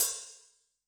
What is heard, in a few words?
HiHat; Yamaha DTXpress-II